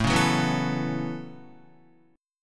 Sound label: A 6th Add 9th A down Guitar; A6add9 strummed